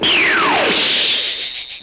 flying

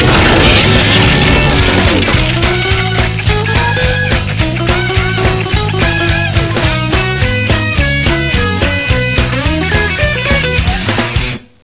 teleport; merryxmas

